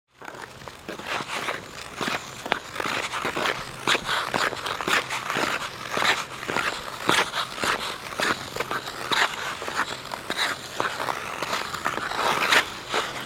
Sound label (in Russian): hokkej odinochnoe katanie igroka ostanovka